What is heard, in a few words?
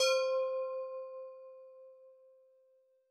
Bell; RB Flava; rnb flava; MPC expansion